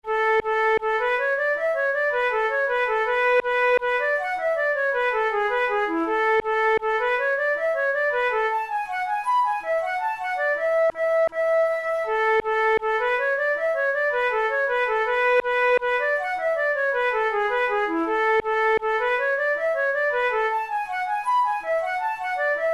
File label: hornpipe